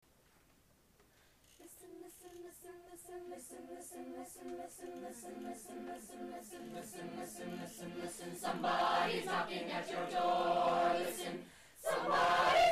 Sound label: chorus